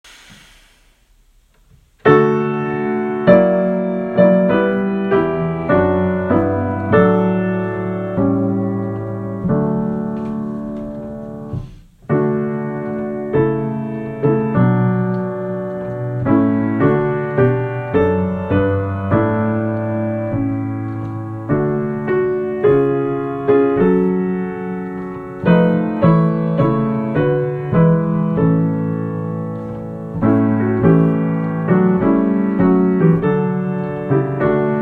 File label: O Canada D Major